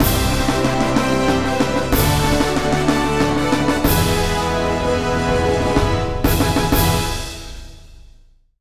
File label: victory; undefeated windows gamerip 2015